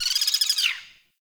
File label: Ext Close Bird, Ambience